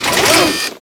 Shipyards Robot Arm Large Raise; Ambience; Props; Warframe; Grineer Shipyards

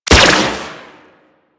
Dispersion Laser Fire; Riot Moa

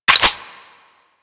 Clip Out; Warframe; Vipers

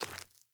Sandals Walk Rock, Footsteps

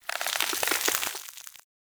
Decoy Bow Draw; Powers; Fey; Saboteur

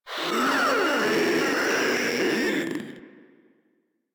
Proto Infested Runner Vocal Attack; Enemies; Vocals; Warframe

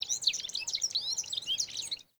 wetland forest day bird close; Ambience

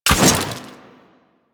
Quill Dart Gun Mech; Warframe

